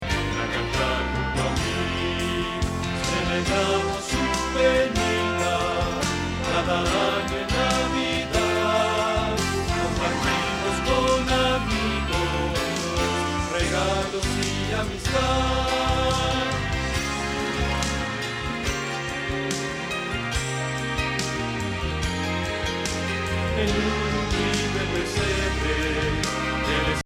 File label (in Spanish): Bajo